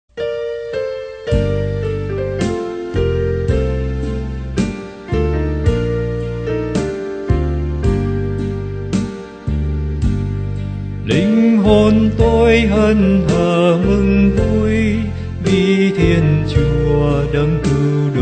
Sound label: MAGNIFICAT BASS